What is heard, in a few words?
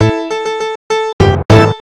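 achievement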